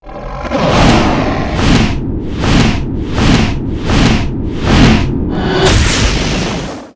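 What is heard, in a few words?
Dragon Attack Skill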